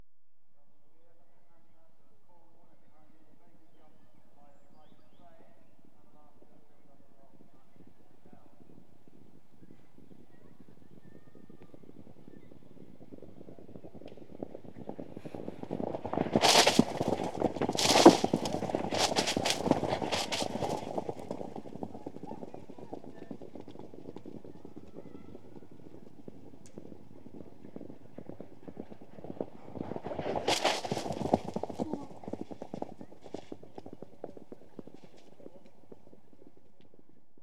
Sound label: Point TO Point Racing, Field Pass; Equestrian Events; BBC Sound Effects Library